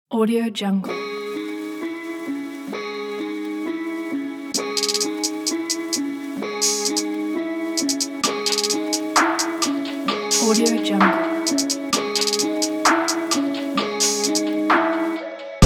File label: sad hip hop